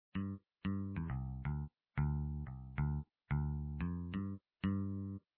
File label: Bass